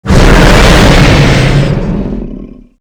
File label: dragon threat short